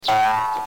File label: Toon